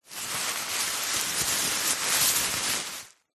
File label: foley paper thin stuffing crumpled handling rustling; zapsplat